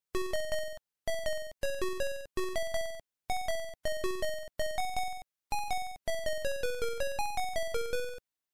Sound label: frogger tweener; Arcade Themes